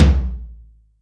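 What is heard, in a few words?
Room Floor, Acoustic Tom, Yamaha DTXpress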